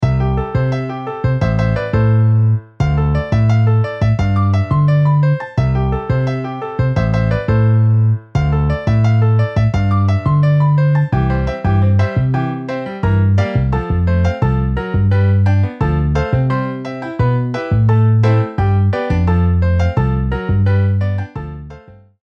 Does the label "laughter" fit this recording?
No